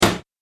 Arcade Game Bang; video game; retro inspired; Video Game Sound Effects; Think Nintendo, Atari, C64; Andrew Fleming

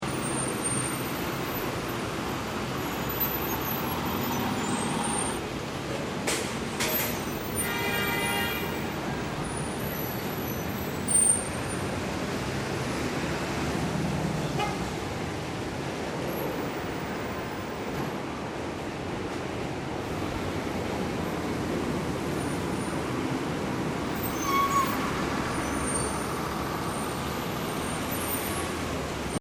Urban Traffic Brake Squeaks Short; Lax; Taxis and buses idle and drive away. Heavy, slow moving traffic; Traffic And Car Sound Effects

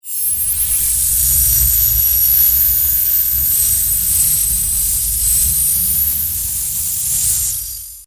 Electric, Discharge, Magnetic, Complex, Scifi; 5.1; BlastWave

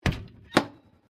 Icebox 1940s Door Open; Various old or antique devices; Appliance Sound Effects; Appliances (Sound Effects:Household)

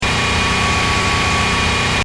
Engine: High Revs Loop, car; Cars; Vehicles; Stuart Duffield

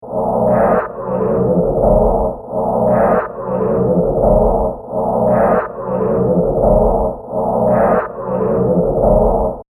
Trash Compactor, sci fi; science fiction sound effect

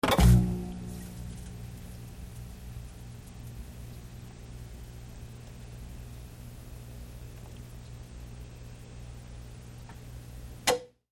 Tv Turn On Off; Televisions (Sound Effects:Electronics)

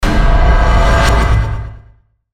Hit To Whoosh Ghostly Airy; Blastwave

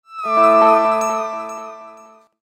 High Elf Magic Ethereal Spell; fantasy sound effect; Magic Sound Effects; role playing games, magic videogames; Andrew Fleming

